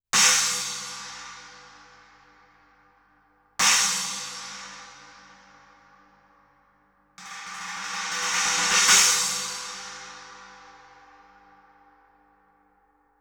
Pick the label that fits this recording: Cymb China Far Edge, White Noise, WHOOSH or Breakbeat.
Cymb China Far Edge